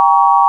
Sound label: sine; ものづくり基礎工学（情報工学分野）; 音の波形と分析